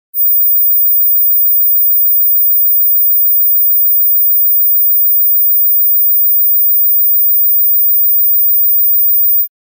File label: 30000 Hz